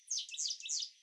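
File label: OLIVACEA, Darwin's Finches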